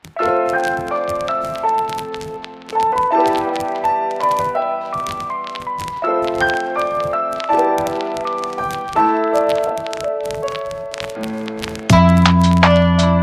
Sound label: mysterious kyoto lo-fi